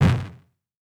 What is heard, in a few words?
card burn